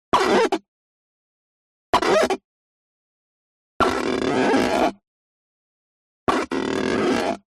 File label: Handwriting Finger Fogged Glass Short